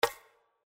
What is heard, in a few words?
Spin Stop; Wildlife Adventure